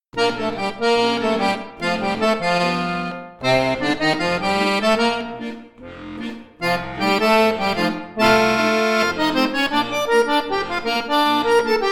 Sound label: Palm Leaf Rag